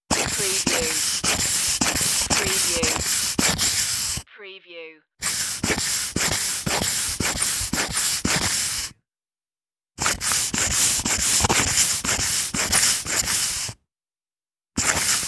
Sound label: air pump